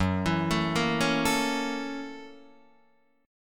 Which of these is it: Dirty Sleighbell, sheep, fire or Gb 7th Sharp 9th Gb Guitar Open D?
Gb 7th Sharp 9th Gb Guitar Open D